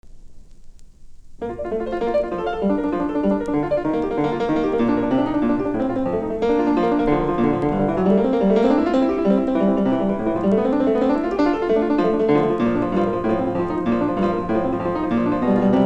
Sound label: prelude In B Flat Major; Bach; WTK 1; Glenn Gould; Reissue on the 1955s USSR Accord